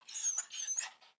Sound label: Pet Dog Small Idle; creatures; pets; Lego